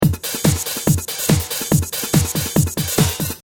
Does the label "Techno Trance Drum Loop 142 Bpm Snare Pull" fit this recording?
Yes